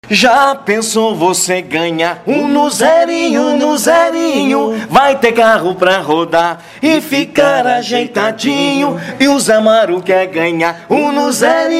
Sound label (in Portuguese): vinheta cantada